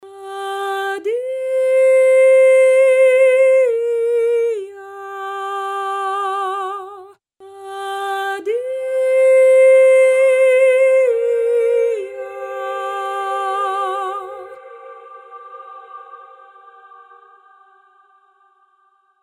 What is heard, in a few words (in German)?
Lyrical Vocal Phrases Trocken; Mystique